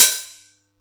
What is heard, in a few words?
Yamaha EX-5